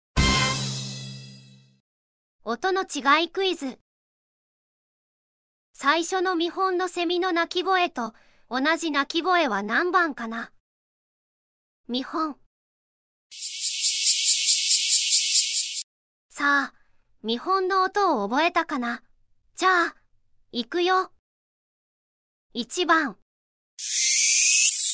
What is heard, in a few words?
quiz cicada